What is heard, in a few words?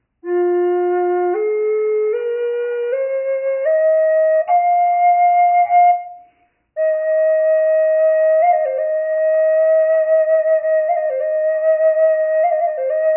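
reverb, flute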